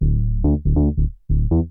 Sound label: bass E3; Techno Music Maker